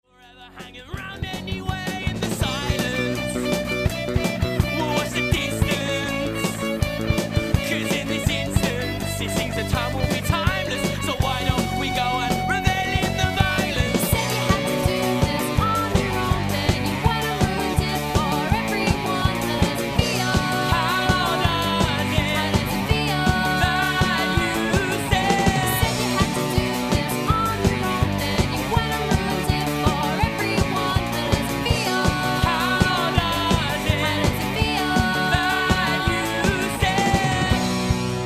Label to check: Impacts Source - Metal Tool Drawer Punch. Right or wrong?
Wrong